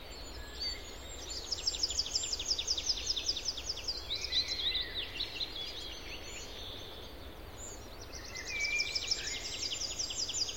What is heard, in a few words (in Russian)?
raznogolosoe bezmyatezhnoe nezhnoe penie ptic